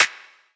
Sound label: clap one shot, Pop drums; run; Slate; SD PASADENA Pop Sample Pack